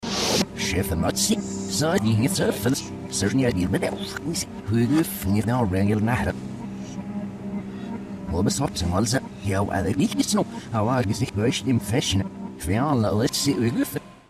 stun; reverse

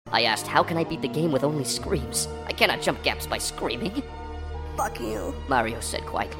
screams

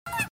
yay

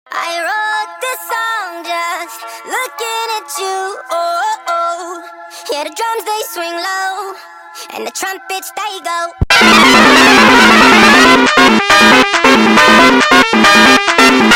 meme trumpet

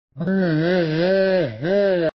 bwah

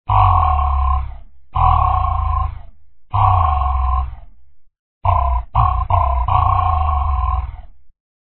EEEE; vapesquared

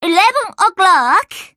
clock

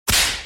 whip

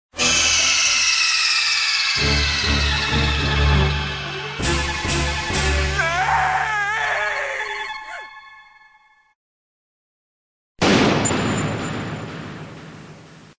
game over

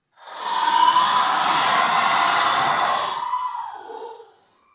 augh

